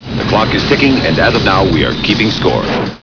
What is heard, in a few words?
clock